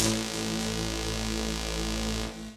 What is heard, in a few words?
technosyn2, Techno Trance; Ueberschall; SYNTH